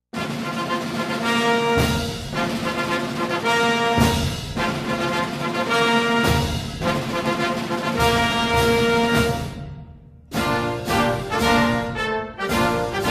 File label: Hail to the Chief Instrumental